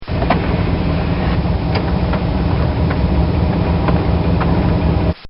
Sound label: Driving Wipers On